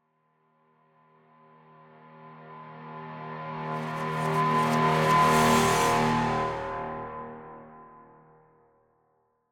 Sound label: Tonal Deep, Trailer Reverses; Async Audio